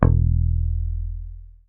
MUTE E1, Bass; Sampleheads; Will Lee Bass Library

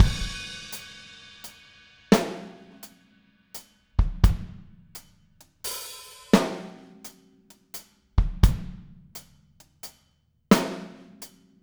Verse, Full Mix, Drums; Sonic Foundry (Sony Creative Software); Drums from the Big Room The Mixes; Demo Projects

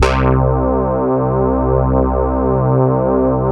KITCHEN SYN; Greytsounds; Scott Peers Sound Engineering

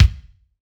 BD, BASS DRUMS; EdgeSounds

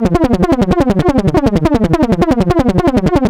ALARM, SCI FI ALARMS; Ambient; Zero-G; Ambient 1

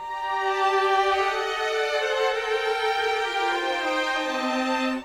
Strings; Sonic Foundry (Sony Creative Software) - Orchestral VOL-2; Modern 36

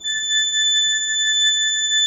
3D Organs Pipe Mix Celeste; E-MU Producer Series Vol. 5 – 3-D Audio Collection

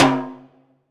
SR L TIMBALE, Drum Mashines, SR-16 DRUMS; EdgeSounds

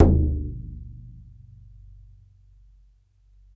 BODHRAN, Ireland; World Combos; E-MU Producer Series Vol. 7 – Old World Instruments

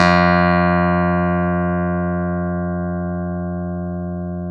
CLAV; Roland LCDP10 Keys of the 60s and 70s 2; Clav CA

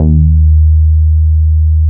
Pro 1 BASS EB2, Vintage Keyboards; Big Fish Audio; First Call

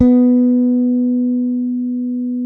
SUSTAIN, Bass

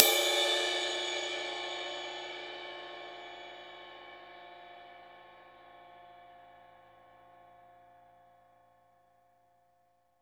Drum Dry; East Collexion; Drum 1

